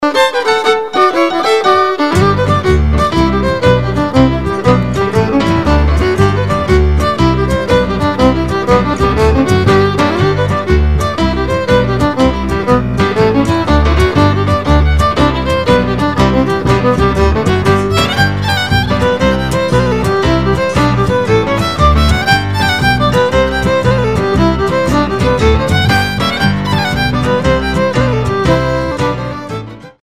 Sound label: Jig